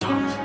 Dogs

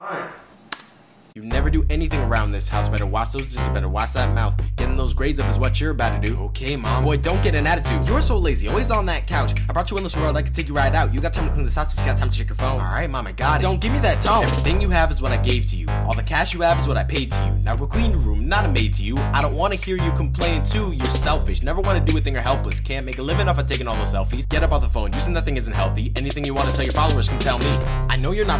Mom rap

